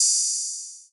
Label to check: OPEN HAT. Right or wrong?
Right